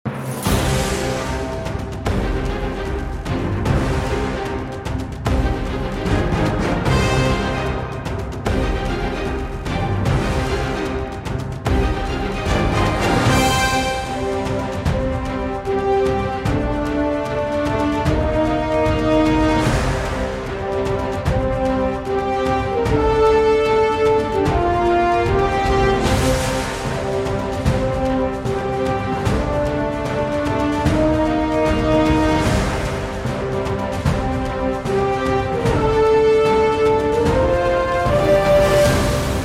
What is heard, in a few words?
forest dragon stage bgm; dragonnest